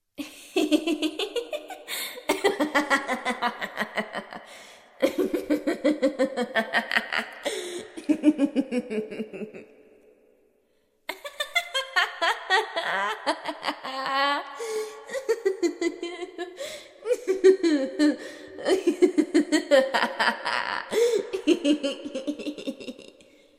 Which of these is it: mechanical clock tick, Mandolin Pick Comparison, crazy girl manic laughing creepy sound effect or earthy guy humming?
crazy girl manic laughing creepy sound effect